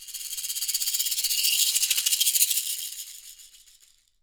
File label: SHAKER, Percussion; CAXAII